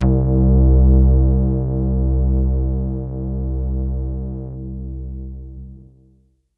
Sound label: BASS